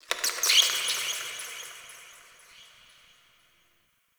Forest Bats; Warframe; Grineer Forest

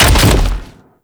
Land Default, Foley; Warframe; Sentients; Erra

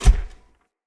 Step Default, Footsteps; Enemy; Characters; Mendicant; Mnd King

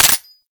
Scaldra Pistol Mech React

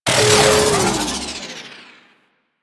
Mutalist Death, Vocals; Warframe; Proto Infested

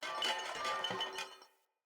Corrupted Battle Dog Bell Tail Wag Idle; Foley

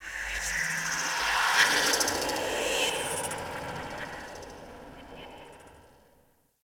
Corrupted Artifact Random; Ambience; Props; Warframe; Orokin Derelict

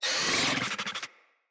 Infested Charger Attack, Enemies

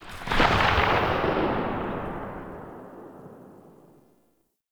Incarnon Wrist Gun Tail, Weapons; Warframe; Tenno

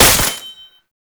Uzi Last Shot; Warframe; Tenno; Prime Tenno Uzi; Weapons

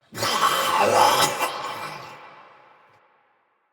Death, Barks, Battle, Soldier; Mendicant

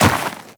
Walk Snow, Footsteps; Warframe; Tenno

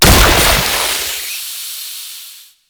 Infested Survival Purification Machine Explosion; Warframe; Nineteeen Ninety Nine

